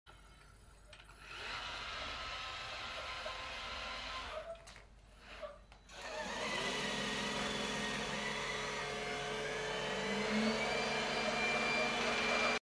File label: Robotic sweeper